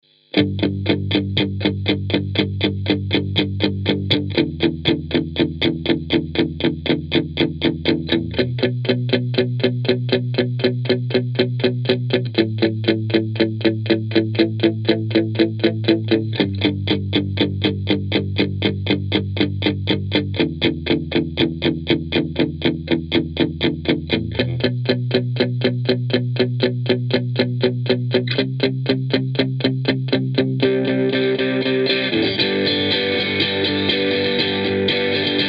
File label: analogman ts-9 brown